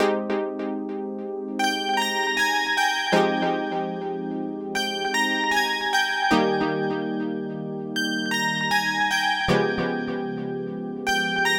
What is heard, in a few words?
Keys JUNO 106 152bpm